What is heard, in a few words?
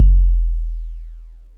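bass drum 808 SP1200 E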